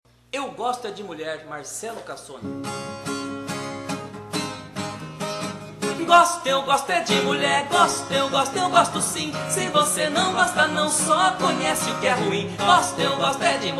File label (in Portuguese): violão e voz